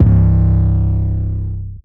808, trap drum kit; BlackPanther; MZ BEATS - FREE TRAP DRUM KIT

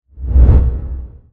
SWOOSH BASS Slow; SFX Royal

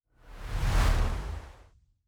big whoosh

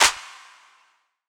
Clap, trap drum kit; MZ BEATS - FREE TRAP DRUM KIT

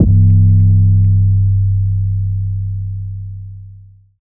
808, trap drum kit; samson; MZ BEATS - FREE TRAP DRUM KIT

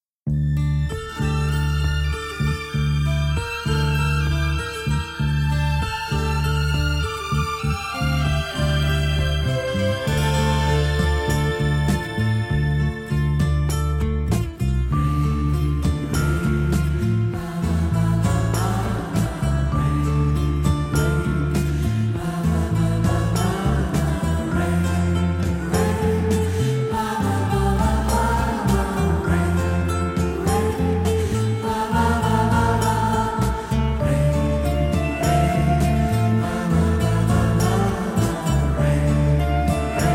Rain Rain instr

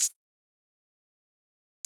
HiHats closed, trap drum kit; MZ BEATS - FREE TRAP DRUM KIT

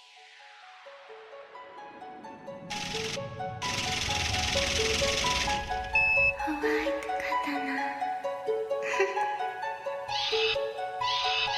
Bass Test

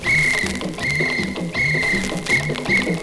LP loop, Vinyl static; polygoniix Intro Essentials pt2; Electronic; Glitches; NoiseCollector; voodoo

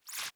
digital whoosh

